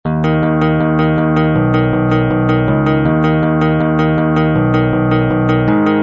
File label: 160 arpeggio bass